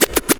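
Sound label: SCRATCH FILLS; AMG Megabass REMIX! VOL-1 & 2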